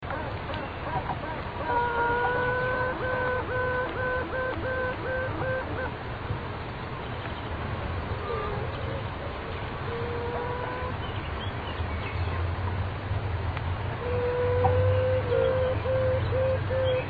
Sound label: honey bee queen singing le chant de la reine